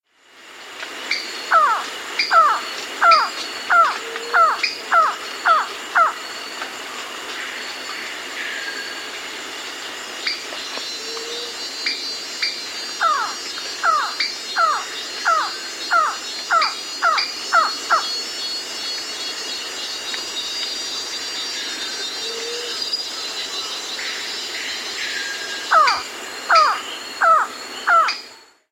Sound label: Band-Tailed Antshrike, Nature's Songs, S. America